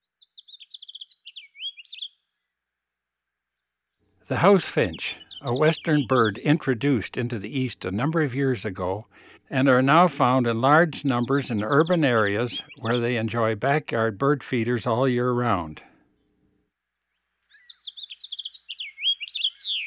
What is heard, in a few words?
House Finch; Eastern North America; Dan Gibson; Wildlife Identification By Sound